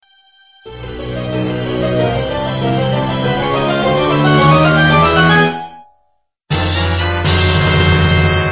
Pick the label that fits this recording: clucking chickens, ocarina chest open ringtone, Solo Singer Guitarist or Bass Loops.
ocarina chest open ringtone